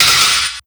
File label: Percy Hit, FX; Sonic Foundry (Sony Creative Software); Esoterik Beatz